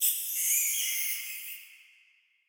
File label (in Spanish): Ambiente galerias rata; Yesterday Origins